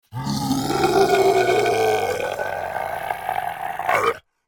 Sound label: MONSTER Yeti roar